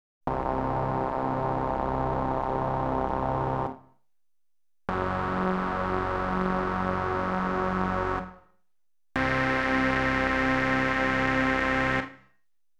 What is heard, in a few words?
dance & techno, lead; Inspiration Zone